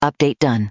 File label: ota done; HA-MIC05R2